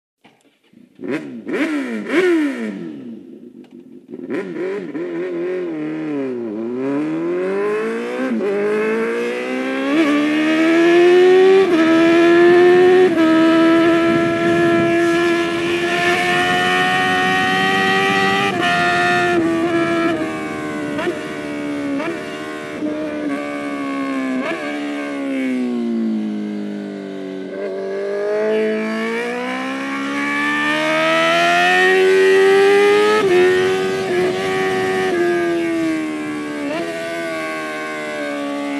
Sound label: full power, moto